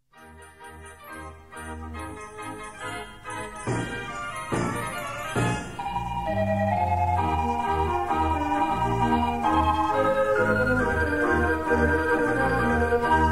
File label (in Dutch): draaiorgel; de arabier; fontana